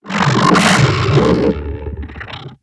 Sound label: alien